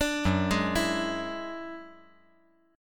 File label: F sharp 7th Suspended 2nd Sharp 5th; arpeggiated; JGuitar